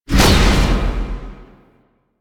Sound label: Bash Cast Release; Warframe; Warframes; Hoplite